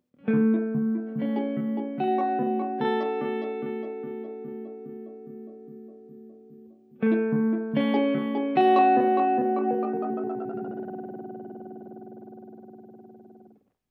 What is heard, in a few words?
Harmo Dual; Beetronics Seabee